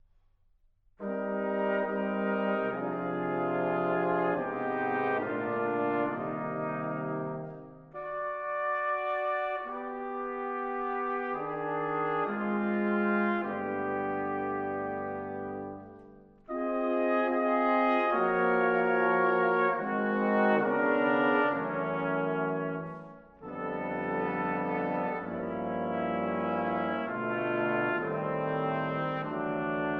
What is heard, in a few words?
Five Engravings in Brass, Corale primo; Incisioni